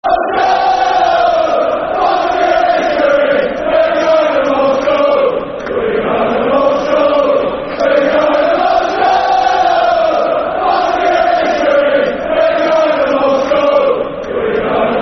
We're Going To Moscow, Football Chants (Soccer Song); Chelsea Cfc